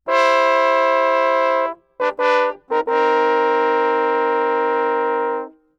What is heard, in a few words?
85 CmajCmin hi part, Brass; 90 s Sample CDs; PowerFX - Downtown Orchestra